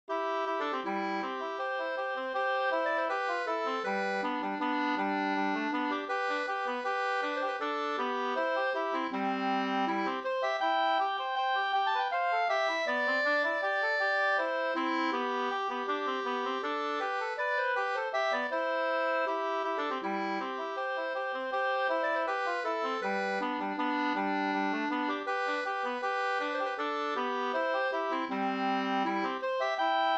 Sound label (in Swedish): vals; Johan Bohlin